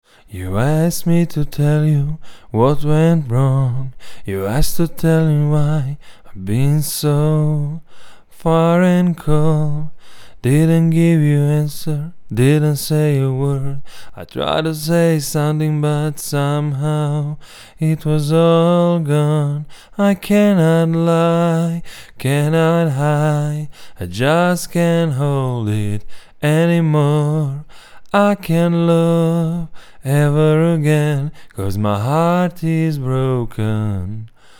vocal; mics

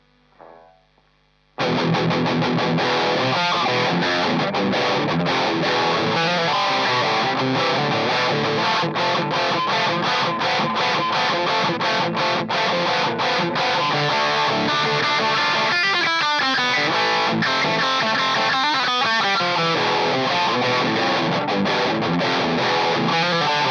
magnets; blackstar

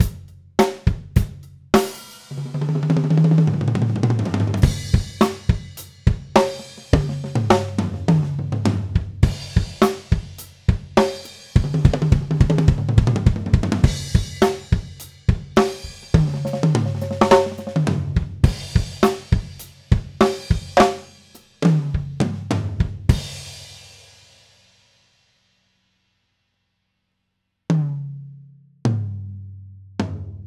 Toms, drum

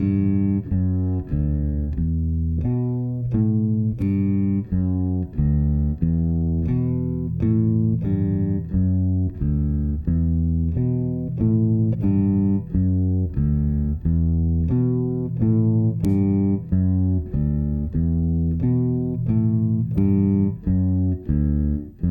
bass click, click sounds on bass track

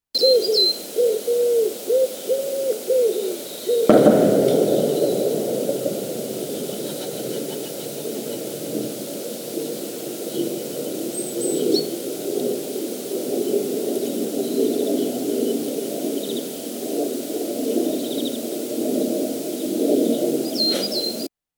sonic boom

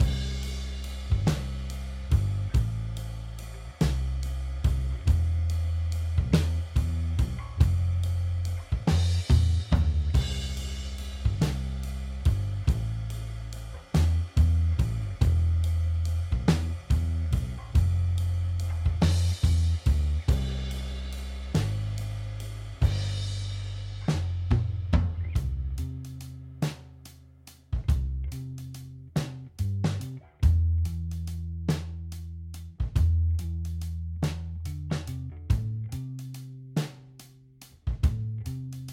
drums and bass; rhythm section mix

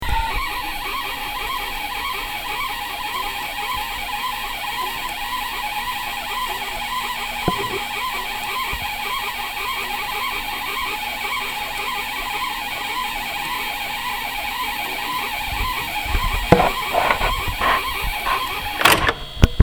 388 squeak; squeaking tape; motor

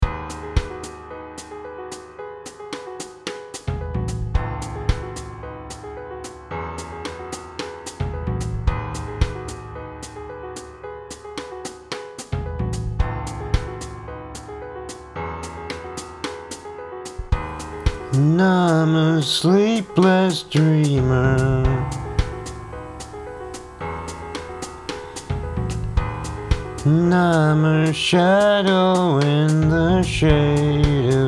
piano idea vox

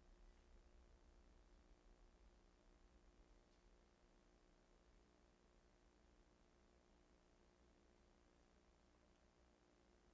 raw, sax recording

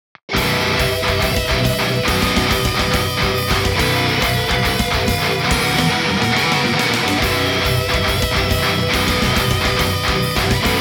something metal mix

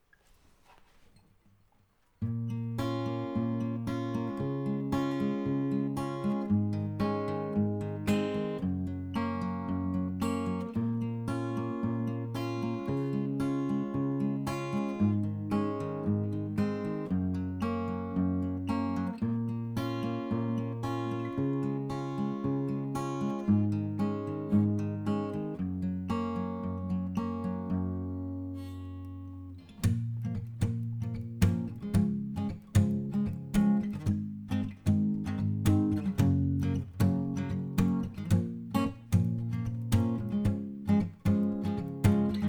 guitar; acoustic guitar track

